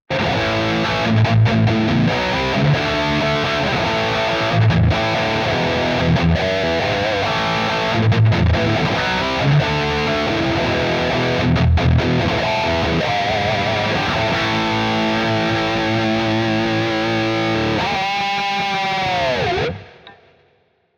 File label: Rhythm Amp; Archetype Petrucci